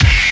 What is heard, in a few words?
pump up hit; Punches Hits Discoblasts; single hits